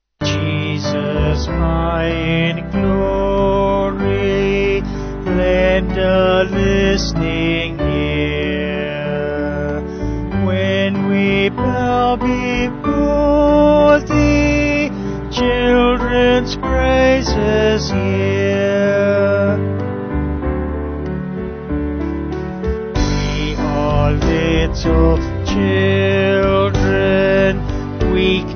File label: Vocals Band; Sung Lyrics